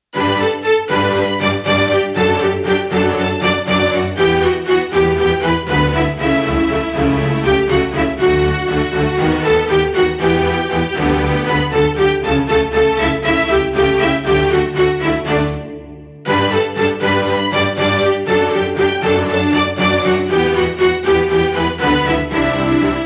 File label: full strings